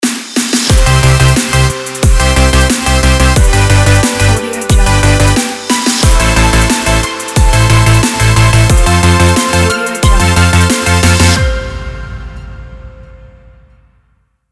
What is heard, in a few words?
positive energy future bass logo